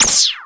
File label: stonecurse